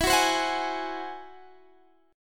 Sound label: G sharp Augmented 7th